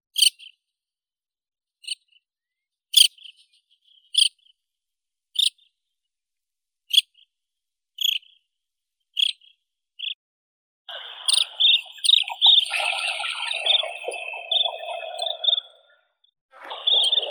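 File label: lesser sand plover, Charadrius mongolus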